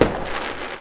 firework pop

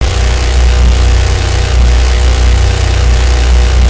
silverback machinegun loop stereo; gow

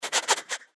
giant rat sniff; npc

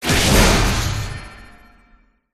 level up; g3ui fort

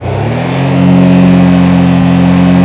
simulated vehicles revdown

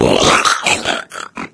pain, maggot; doom3

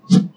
parry, weapons; darksouls

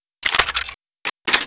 coilgun reload

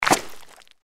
giant rat jump; npc

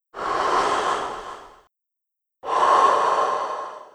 breath

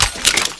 missile, ballista; tfa cso

